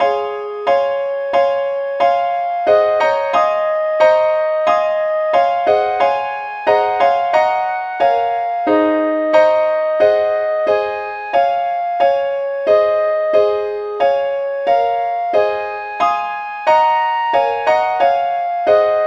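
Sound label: advanced lead, key C sharp; SAINTTHOMAS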